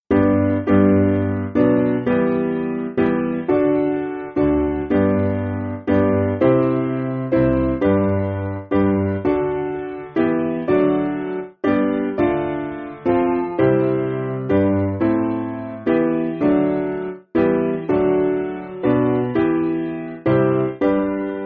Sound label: Piano; Be Present; Adesto Sancta Trinitas